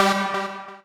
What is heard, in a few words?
lead1 g3